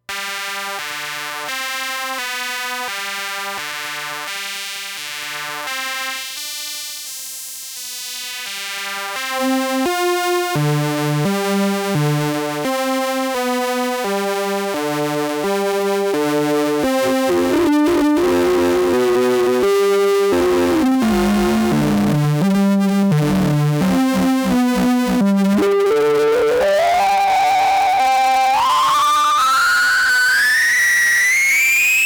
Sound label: Dubreq Gen R 8 HP Filter